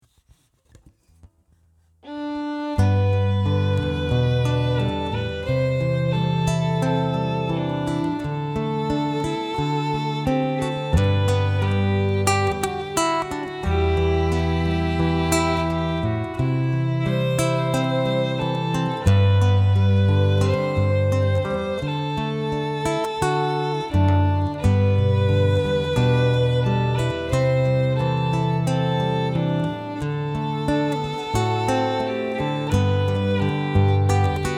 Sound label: Violin Guitar